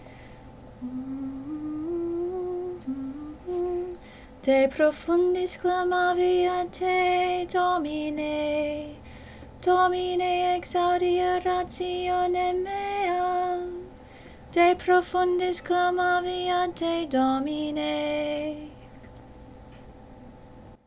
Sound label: psalm toned offertory